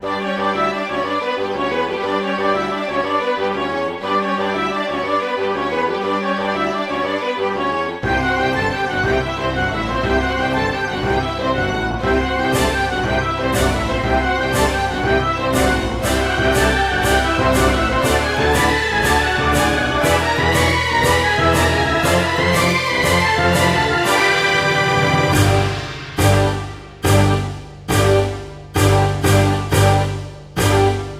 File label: Instrumentals; Serious Sam